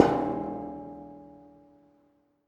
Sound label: Metal perc